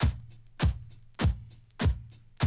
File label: drum loop, 100 BPM